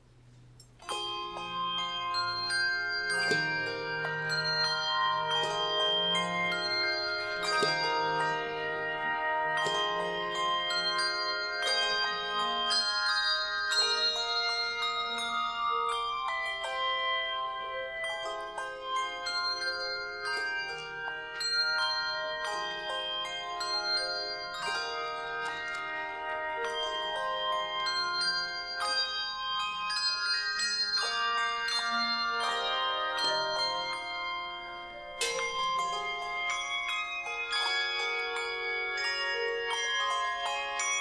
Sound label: Offertory, Carillons